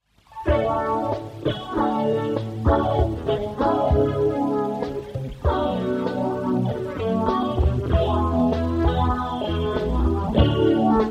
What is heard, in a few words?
euro suspense